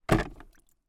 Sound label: household plastic mop bucket with water inside put down on ground